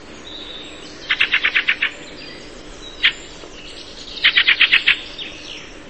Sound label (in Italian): Cesena Turdus pilaris